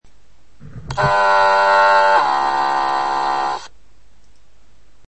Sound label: Bell Foghorn